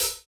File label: DnB Two Step Hit Hat; osu!